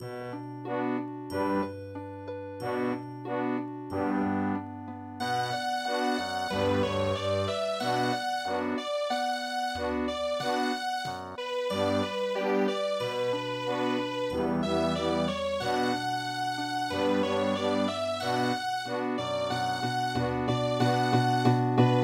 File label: swan lake winer waltz